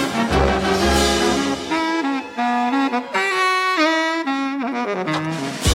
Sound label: solo